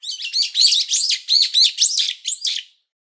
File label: hypsipetes amaurotis cry